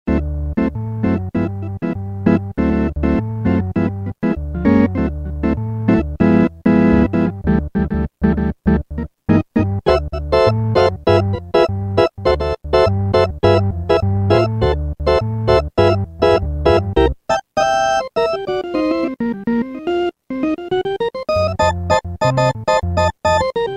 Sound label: 50's Organ, Roland M-DC1